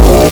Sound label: damage hits explosions; World Of Warships